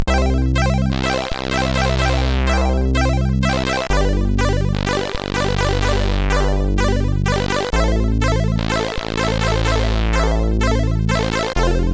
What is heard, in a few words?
jingle